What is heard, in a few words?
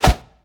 whoosh; smite; god raijin